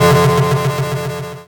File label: Title Transition Delay Short